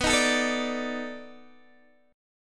B 7th Sharp 9th, down, Guitar, DADGAD

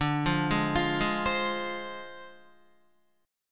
D Minor 7th Flat 5th Guitar; Dm7b5; arpeggiated

